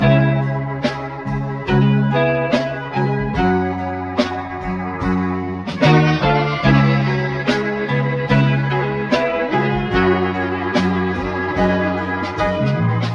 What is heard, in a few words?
piano, Phonky loops